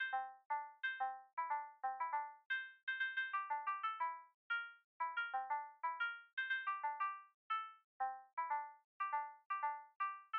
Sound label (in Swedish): melodi rytm